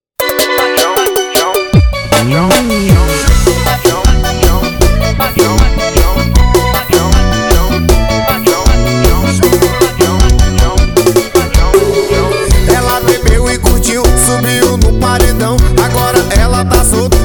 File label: xote piseiro beat